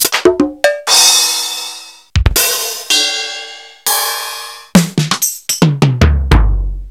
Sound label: Forat F-9000; Sliced